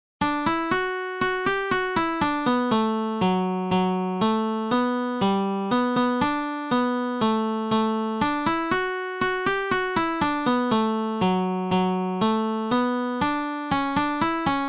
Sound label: st annes reel guitar